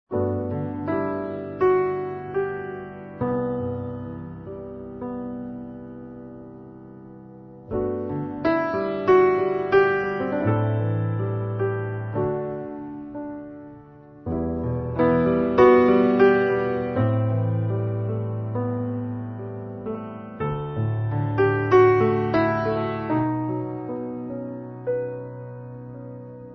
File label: piano solo